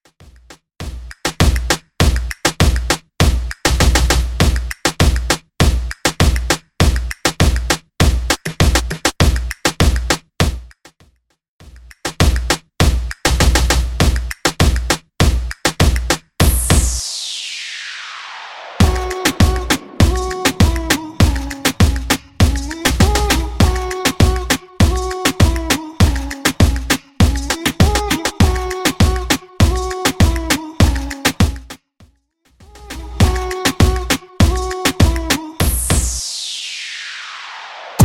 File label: Top40 Moombahton Mixshow Mainstream Re Drum 100 Bpm Dirty